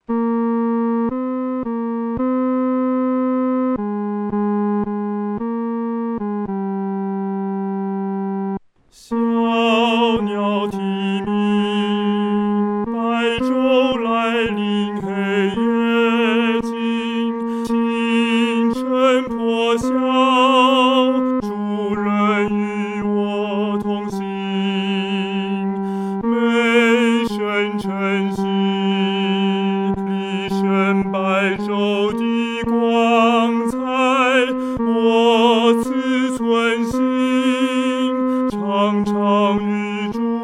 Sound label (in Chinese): 独唱 第三声